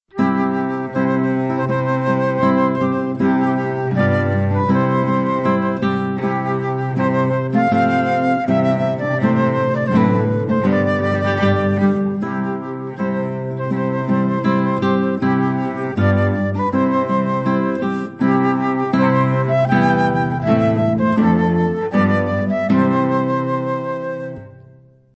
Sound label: Bridal Chorus Trio